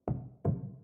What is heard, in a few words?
just Two Steps